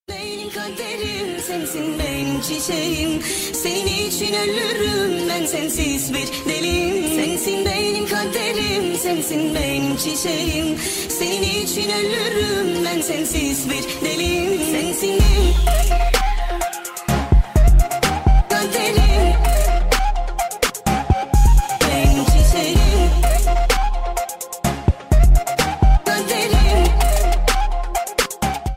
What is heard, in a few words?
Turkish House Rap